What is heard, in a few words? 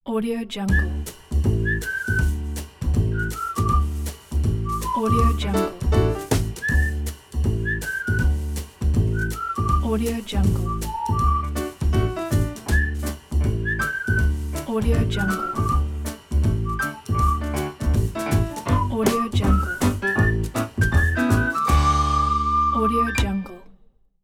positive ident